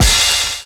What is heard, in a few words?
DR. LOOP, DRUMS; X-Static Goldmine 3